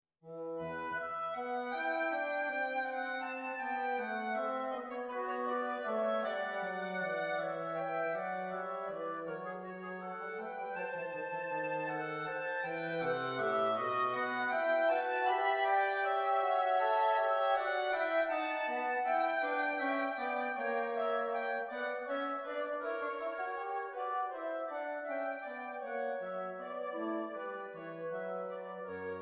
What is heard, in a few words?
Sinfonia in E Minor; No. 7; BWV 793